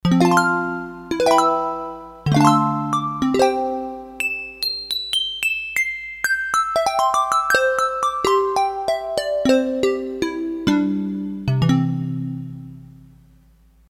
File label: Tiny Hammer; Factory Patches